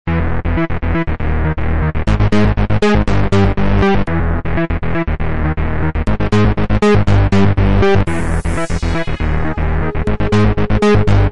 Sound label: Bass